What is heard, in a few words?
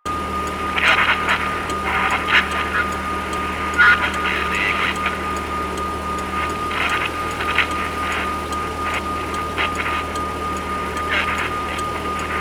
Parked Car Idle Loop; Ambience; Props; Warframe; Nineteen Ninety Nine